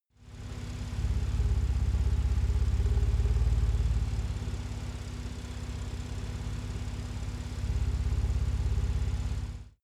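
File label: sports car exterior engine soft growl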